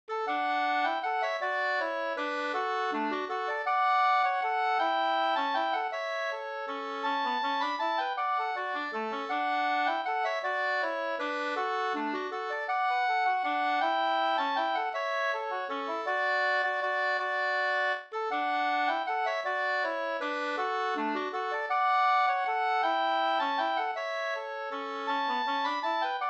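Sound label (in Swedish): vals Brudvals, two-part; av Hilding Andersson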